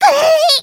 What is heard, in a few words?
pain severe; spyro v2